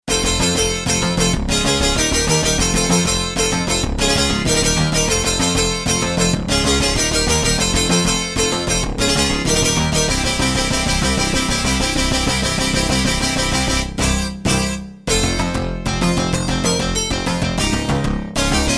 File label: v-synth; darius